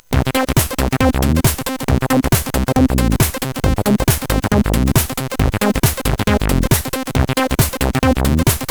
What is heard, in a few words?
Metal Crack, CSG8580R5; hvsc